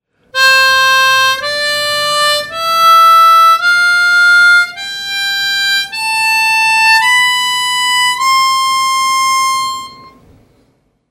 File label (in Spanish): escala C completa celdas 4 a 7, armonica